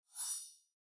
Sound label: Robot, notifications; Infinix; Zero Flip